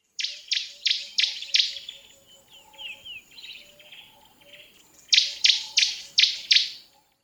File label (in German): Bloekgrasmuecke Camaroptera brachyura; Vogelstimmen